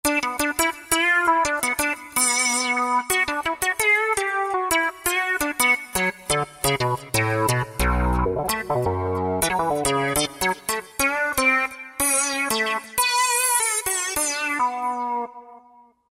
Digirez Lead, Roland JD-990; Factory Patches

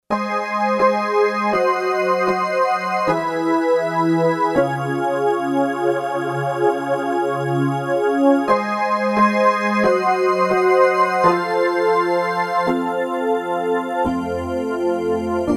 DIGI ORGAN, Roland SC-880; Factory Patches

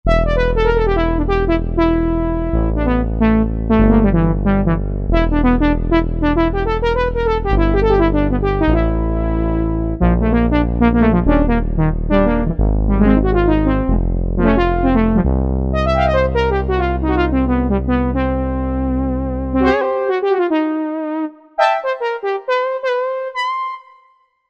Prophet Horn, Korg Wavestation A-D

